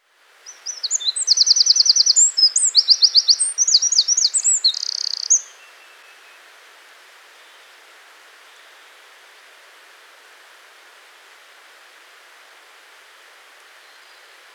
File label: Wren